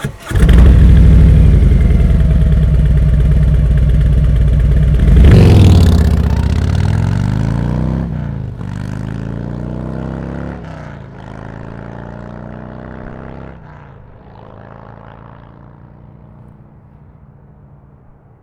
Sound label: Touring 107 4 Slip-On Take Off